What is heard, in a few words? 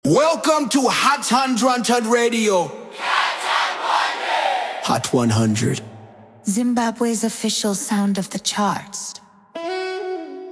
jingle; radio